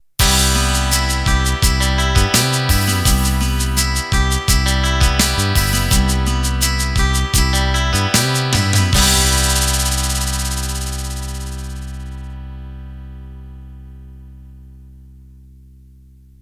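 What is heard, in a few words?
Rock Ballad End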